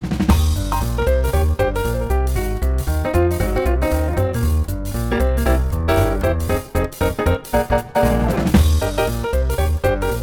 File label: swing